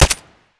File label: Glock17 SD, Guns Fire